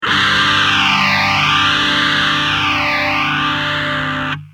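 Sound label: guitar Systech phaser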